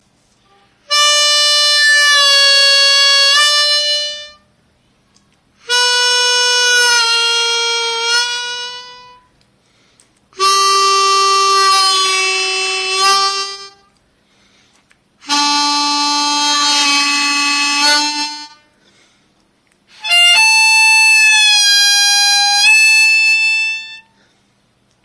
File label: half bend C